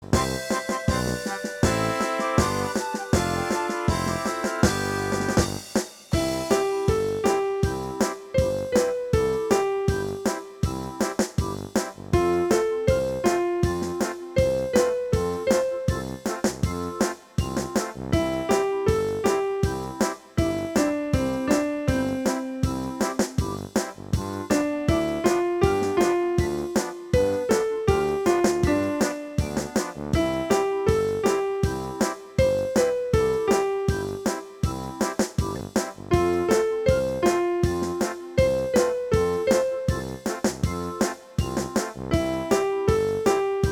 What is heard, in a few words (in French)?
marche